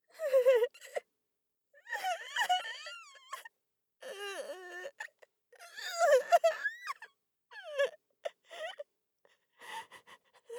泣きながら首を振る; 効果音なし

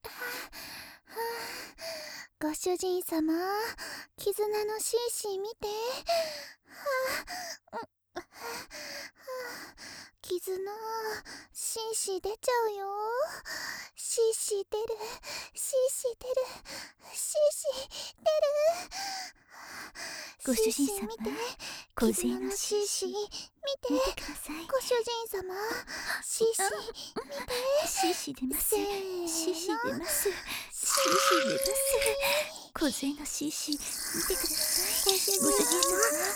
おしっこが途切れないループ; しーしー見て

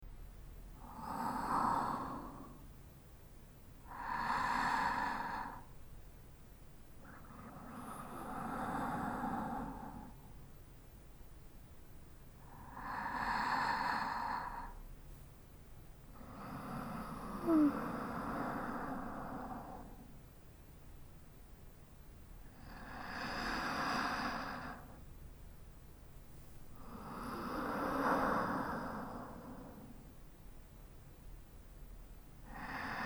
寝息 左側

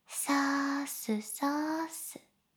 しこしこ音声; 少尉; 牧場戦腺

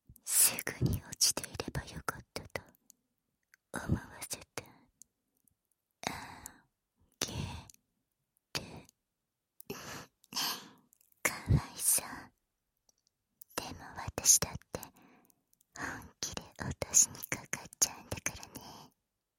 耳舐め; サキュバス姉妹; バイノーラル

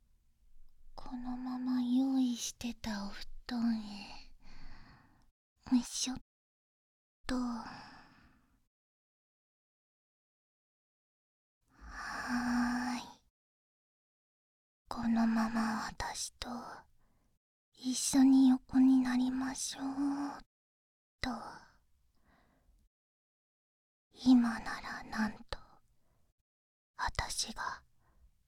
心音+安眠誘導（バイノーラルマイク 効果音なし）, ASMR

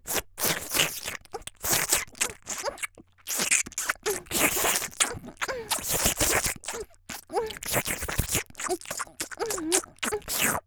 「フェラ」激しい10秒; 綾波系少女

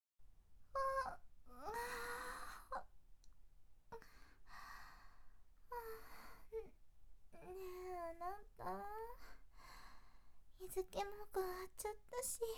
耳舐め添い寝; 耳舐め添い寝で寝かしつけてあげる

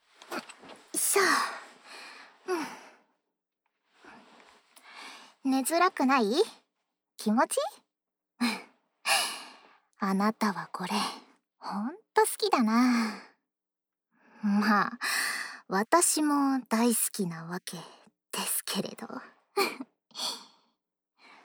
耳かき（左耳）, ASMR; ラブラブ耳かき

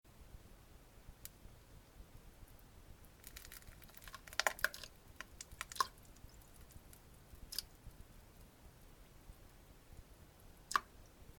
ちょっとやわめうんこ むちょ ちょ音

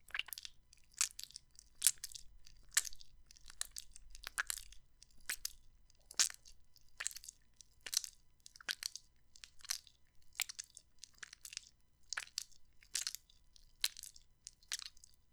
スローピストン

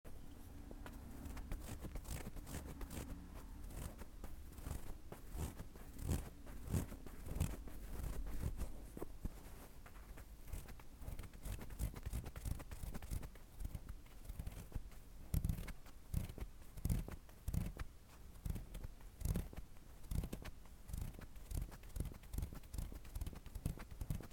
耳かき まとめ 左, ASMR

